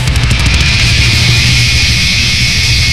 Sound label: metal hit